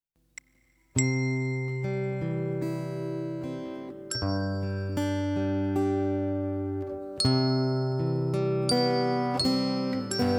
New Guitar bells Mix; Film and TV music volume 2 TRADITIONAL MOODS

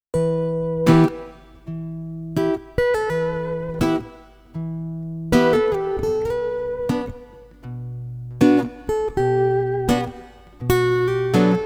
Guitar Mix, Flavour of the east

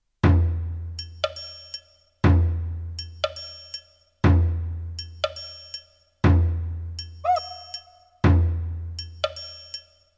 Jungle telegraph, Advertising music Lighthearted; Advertising music volume 3 LIGHTHEARTED

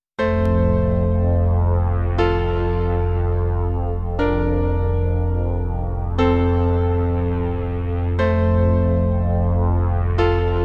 Aldebaranstar no drums; Library music volume 2 UPLIFTING

